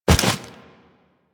Jump; Warframe; Generic Foley

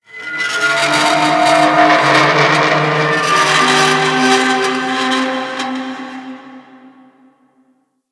Ocean Long Metal Creak; Warframe; Grineer Ocean

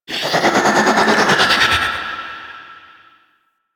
Wraith Reaper Vocal Turn; Warframe

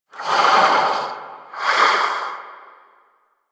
Vocal Breathe; Warframe; Duviri; Dax; Vocal Set A

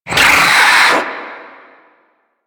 Swarm Vocal; Warframe; Man In The Wall; Limb Vocals

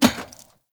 Heavy Grunt Boot Walk Metal, Footsteps; Characters; Enemy; Sinecure

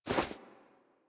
Movement Foley; Warframe; Wisp

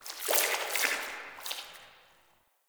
Root Cave Sap Gush; Ambience; One Offs; Neathuns Root Cave

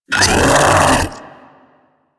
Infested Splitter Joined Vocal Attack Medium; Warframe; Grey Strain

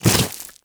Small Land Dirt, Footsteps; Enemies; Warframe

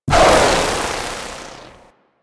PvP End Of Match Burst; DE; Warframe

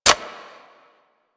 Magnum Mech 2D, Weapons; Warframe; Tenno

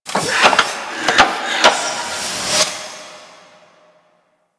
Seismic Disruptor Buildup B; Warframe; Ambience; Grineer Asteroid

